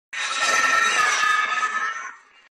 ringbrust charge; sonic x

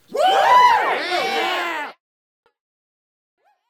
woo yeah

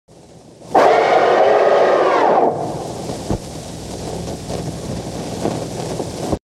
scary; gajaraja films

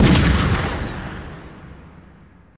gunshot boom

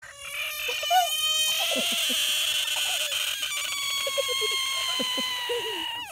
screaming bullfrog

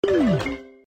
error; animal jam

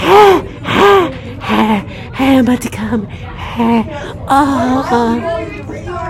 heh; gibby

